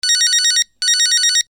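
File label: mobile phone signal; handy signal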